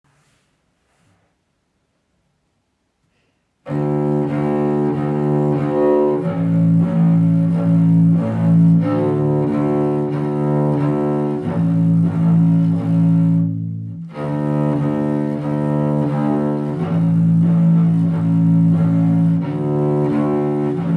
Das Konzert Bass arco

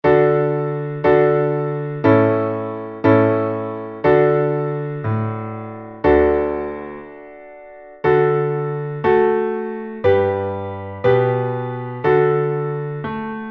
langsam Klavier; Backe backe Kuchen

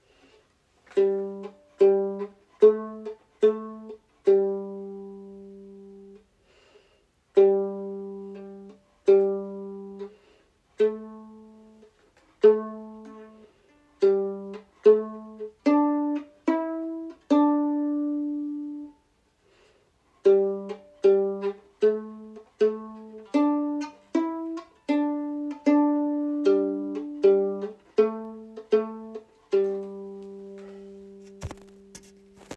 Geige pizzicato

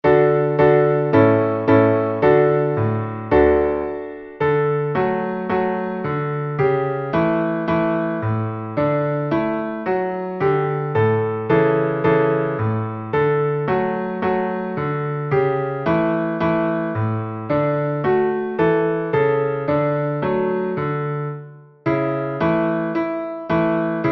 schnell Klavier; Haenschen klein

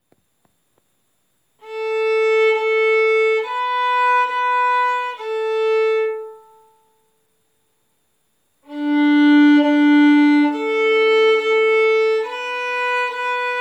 Bratsche arco

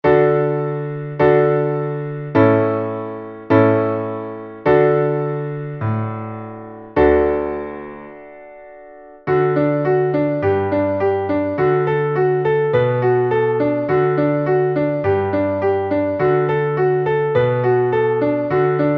langsam Klavier

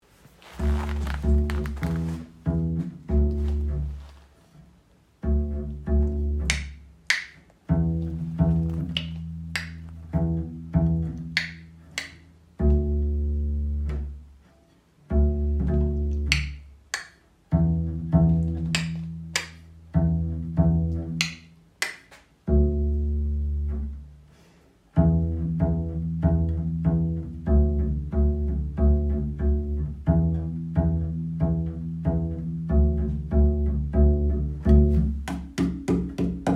tango Bass pizz

